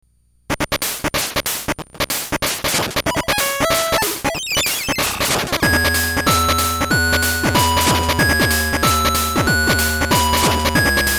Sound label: arpeggio